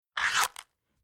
Fire Match Strike Slowly No Flame Match Rattle Inside Box; Flame Sound Effects